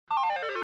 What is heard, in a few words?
real egg sound effect